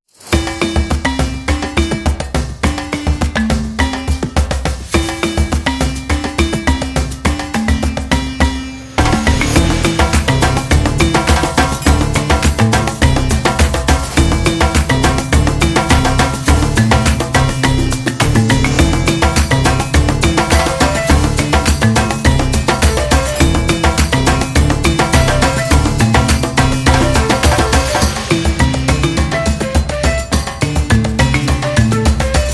Tribal Africa; african tribe music

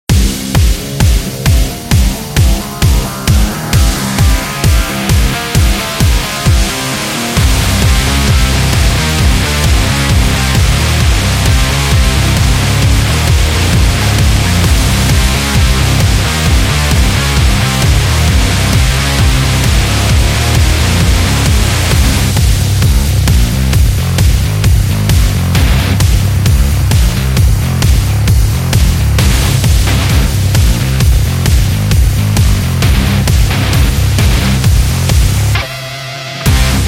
Warrior Fight

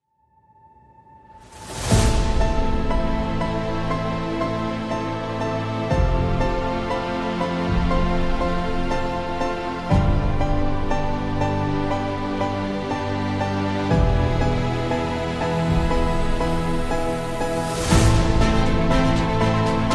Orchestral

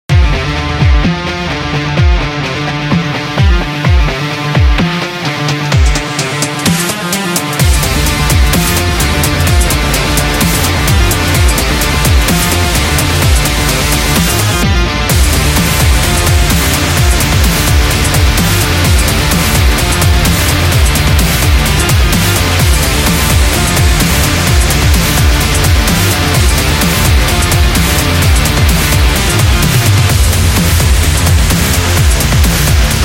Race

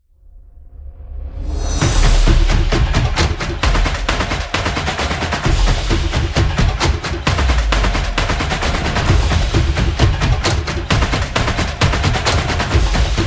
Sport Hybrid Samba Trailer

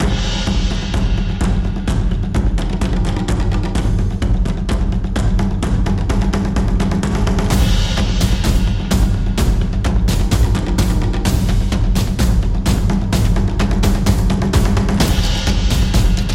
Taiko

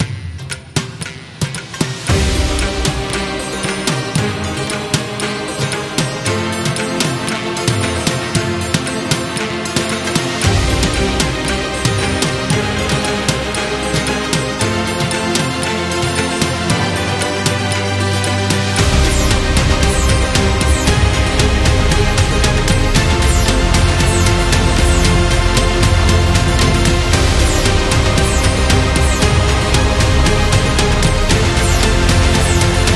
India Epic

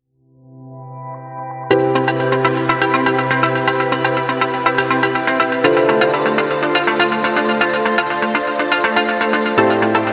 Working Music

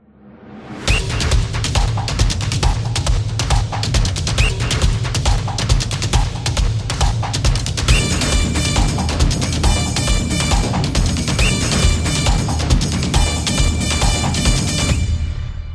Football Music

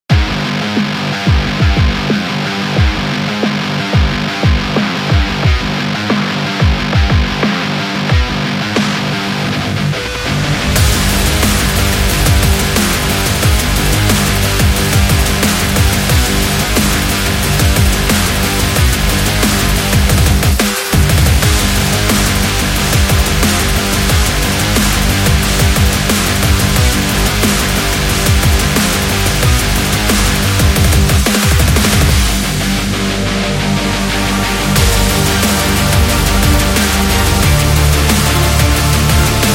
A Metal Power

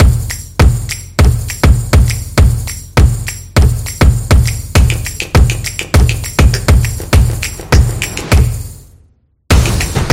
Drums Action Beat Solo; stomps action

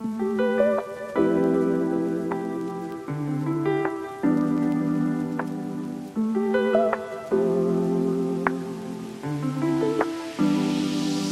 Lofi Beat

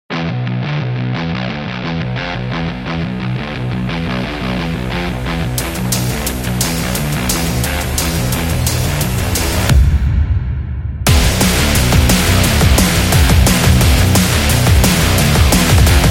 Breakbeat

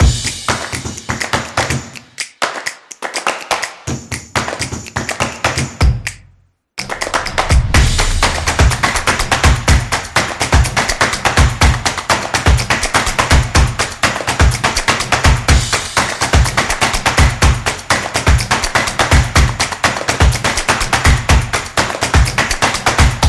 Drum Music

